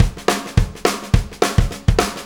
Ballroom Beat, Drum Loops

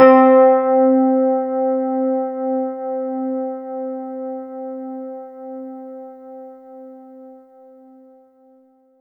C4, Synth Line; 90 s Sample CDs; NiceBeats; SynthLine VOL-23

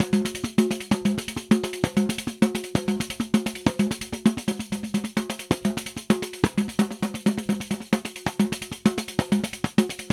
Rio Batucada, 130 bpm

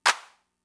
Clap; Fricke MFB-522

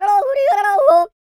YODEL; ILIO; Vocal Planet

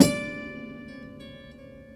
Prepared Piano; Battery 3 Sample Kits

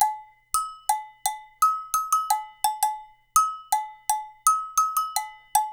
Percussionworks

